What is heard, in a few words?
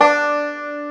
BANJO D3; Proteus3